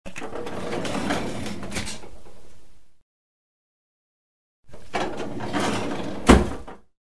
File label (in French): tiroir